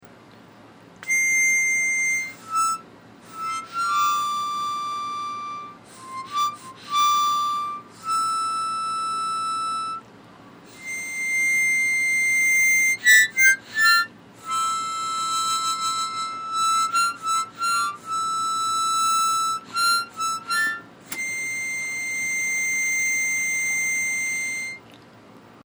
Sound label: Mini harmonica